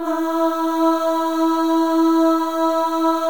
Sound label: Voices, FEM AHS; Spectrasonics; FEM.1 AHS